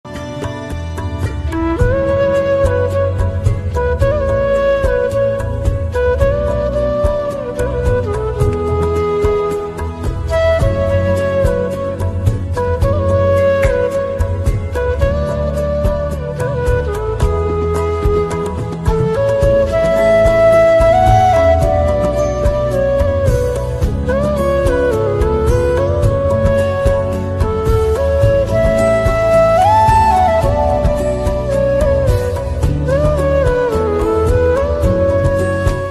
flute ringtone flute music flute

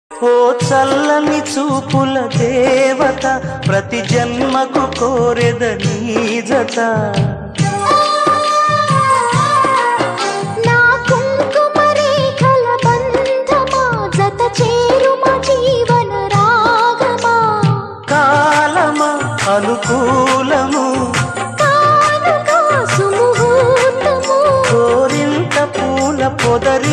telugu melody; rajendrudu gajendrudu movie

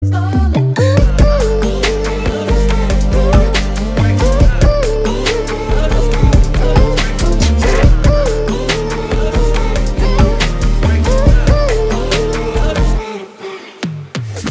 BGM; Doctor

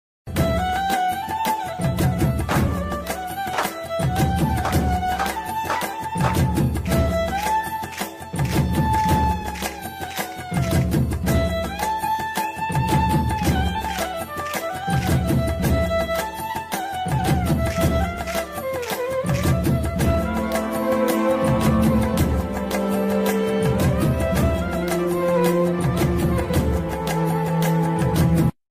bar anthem bgm ringtone tamil bgm ringtones; mugamoodi

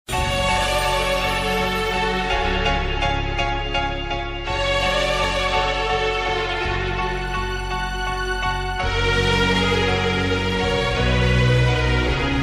love emotional sad bgm music; kushi

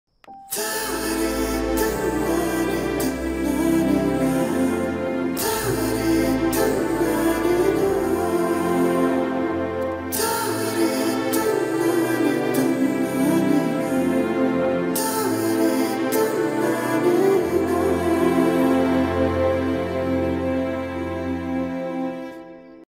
song bgm; thellavarithe guruvaram

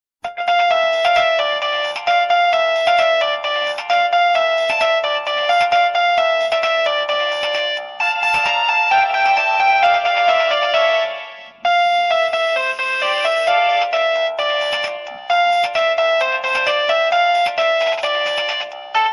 old nokia ringtone nokia mobile ringtone

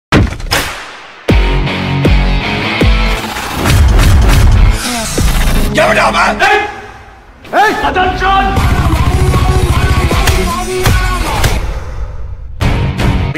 aditya varma bgm tamil

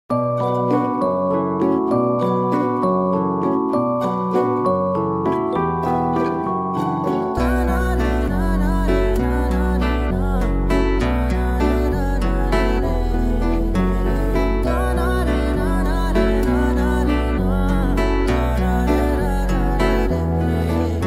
song ringtone bgm telugu love ringtones

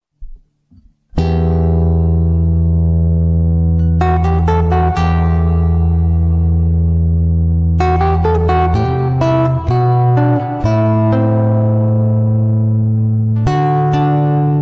guitar ringtone instrumental ringtone

